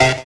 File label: Stab; 'Go Fuck Yourself' samples; lolicore